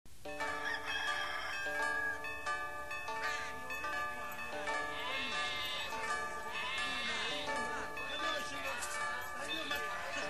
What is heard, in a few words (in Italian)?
folk